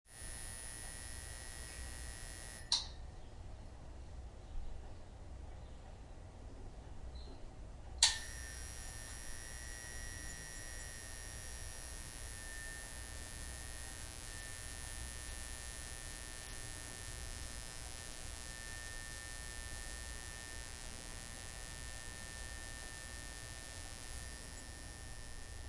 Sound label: light switch click on off buzz ring fluorescent close